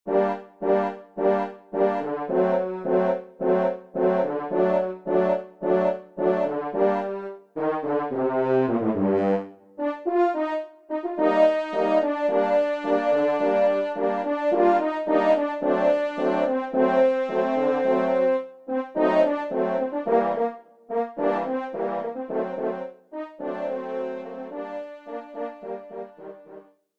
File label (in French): tango quatuor de cors